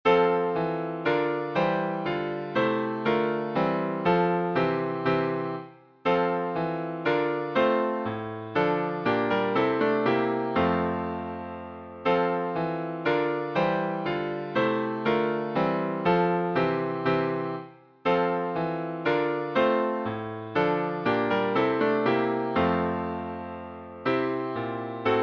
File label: Hymns